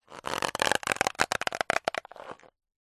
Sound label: foley balloon small inflated rub handle creak; zapsplat